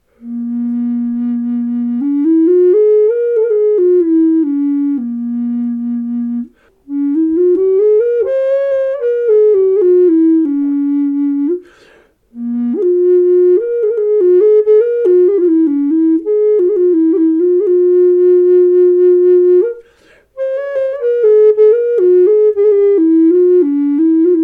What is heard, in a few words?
B3 Shadow Hawk Ebony mouthcap, flutes